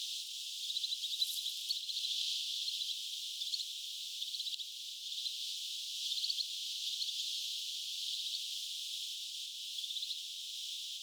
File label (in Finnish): toyhtotiainen saaressa